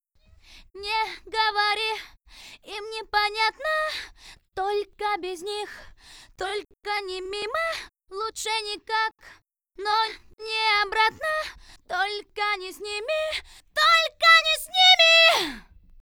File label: VOX Couplet MIXED, acapellas